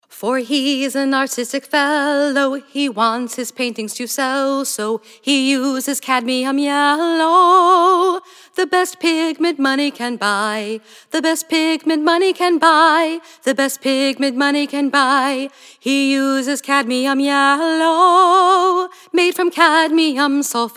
Cadmium Element Jingles

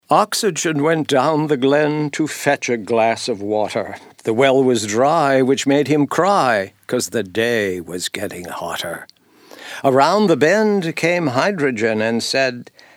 Oxygen Element Jingles

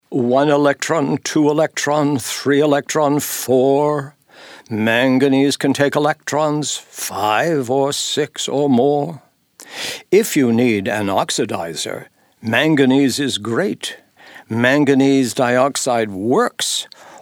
Manganese Element Jingles

